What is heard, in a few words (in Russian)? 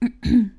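у девушки першит в горле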